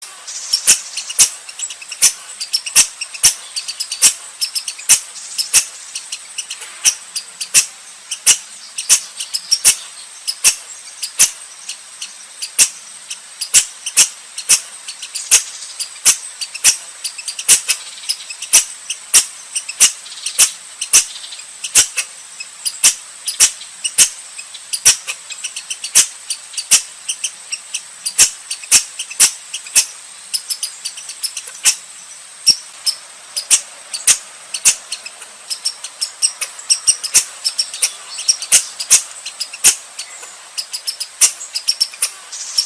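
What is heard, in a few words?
sound of squirrel calling; Tieng Soc keu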